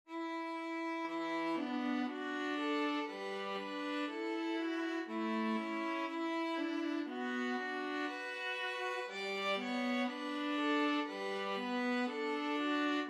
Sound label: wondrous, violin viola duet